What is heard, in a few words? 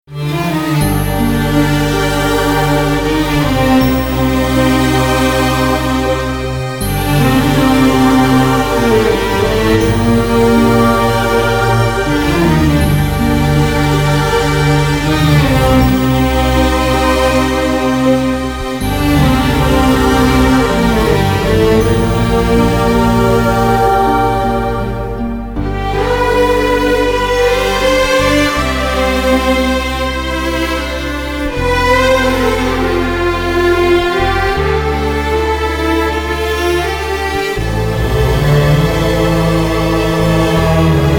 Instrumental